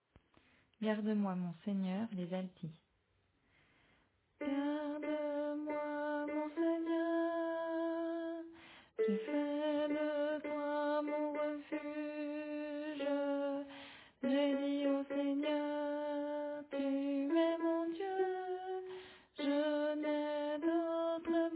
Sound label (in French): Alto